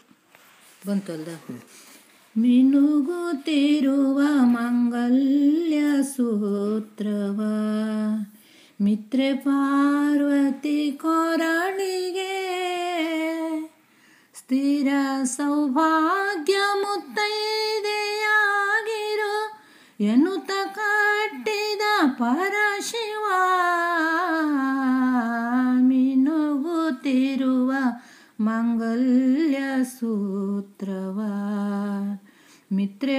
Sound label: Katydid